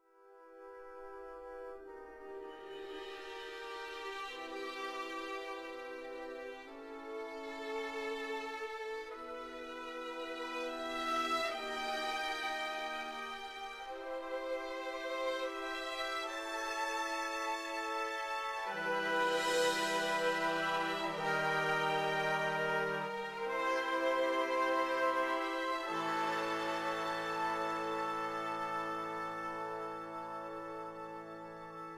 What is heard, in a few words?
Loading Screen; The Amazing Spider-Man 2